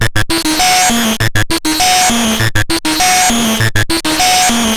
Modular Loop, 100BPM

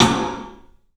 CLUB SN VRB, 3D Drums; 3D Sound

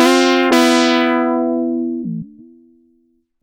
reason syn; Synths and FX; neuro; Sinistarr

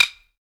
Sticks; SB Sticks

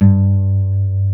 G 2 nylon, Guitars

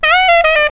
TURNTABLES, Hip Hop

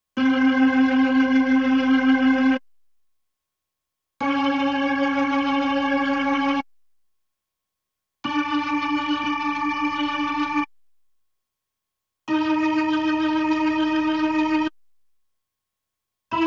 B3 Organ; Masterbits CLIMAX 8

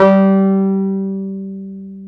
PNO T.Wurly G 4; Keyboards of The 60's and 70's

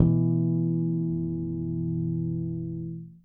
Harmonic One Shot BE, Bass; Bass X

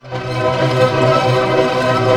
ARP F# MAJ PP, STR ARP; Sound On Sound; Peter Siedleczek's Orchestra

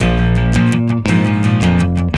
Combo Guitar

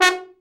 TROMB; Trombone

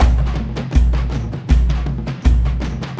AF TRANCE; Spectrasonics; Heart of Africa 2

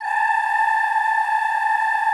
A4, Extended Classical Choir; Best Service

